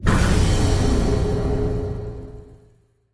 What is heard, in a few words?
button spin; Recode; rampage riches Desktop